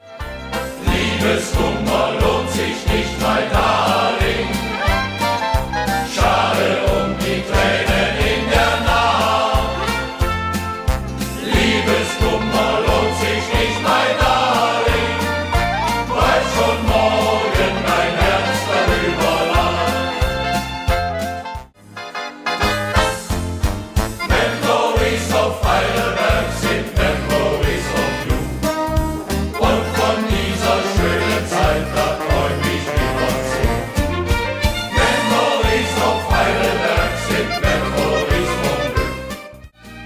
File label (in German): Schlager